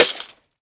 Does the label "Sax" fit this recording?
No